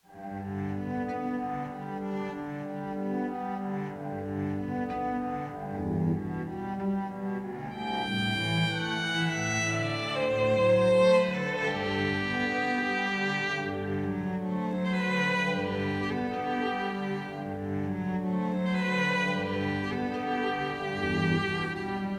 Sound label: Pas de deux transcr. for violin and cello; P. I. Tchaikovsky